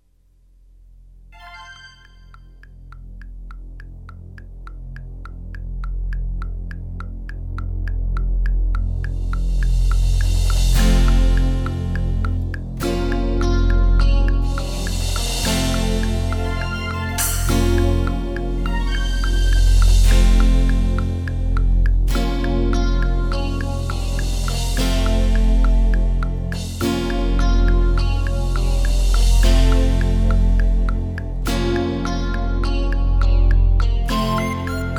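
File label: No Sax Guitar Included Key Of F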